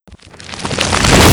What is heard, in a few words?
reverse explosion